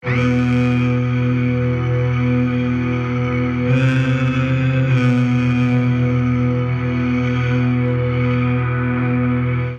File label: Meditations Chromatic B minor 98 bpm, Voice Box; MIDI Clips; Ableton; Factory Packs; Transformed